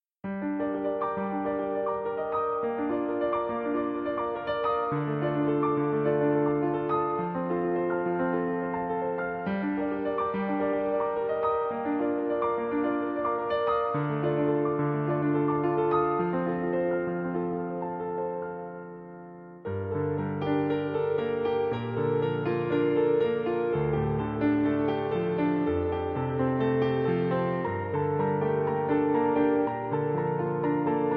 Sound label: Piano Cover